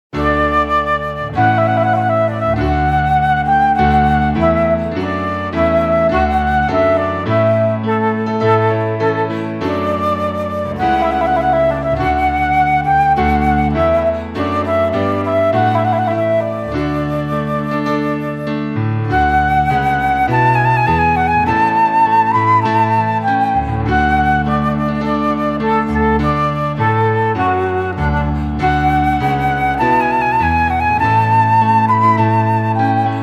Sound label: trumpet voluntary, flute piano